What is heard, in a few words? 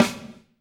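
snare drum, Drums; Bob Clearmountain Drums I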